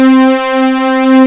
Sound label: PAD C4; Best Service Gigapack 1